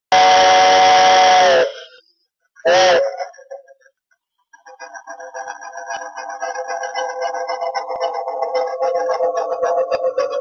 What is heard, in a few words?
TRAIN; miniatur